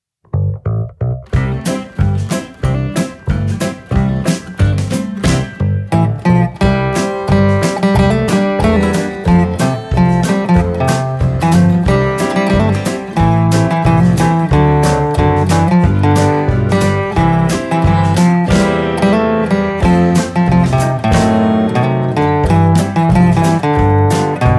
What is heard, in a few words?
Instrumental